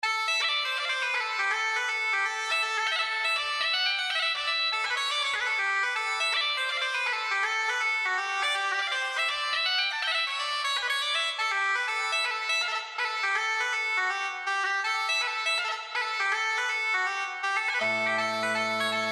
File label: bagpipes; Highland Reels